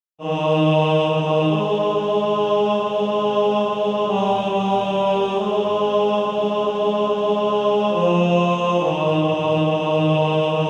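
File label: Male Choir, tenor 2; On Christ the Solid Rock I Stand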